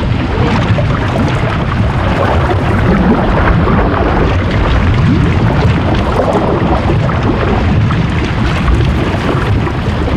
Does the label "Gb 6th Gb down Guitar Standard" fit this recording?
No